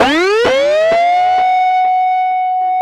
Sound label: Guitar, Trip-Hop Loops; Sonic Foundry; Rondo Brothers